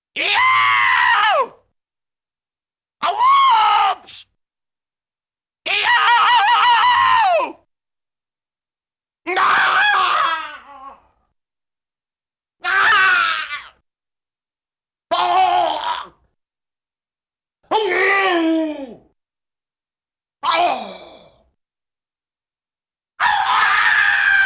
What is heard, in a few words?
Funny Man Yells Screams, Cartoon; Hollywood Edge; Cartoon Trax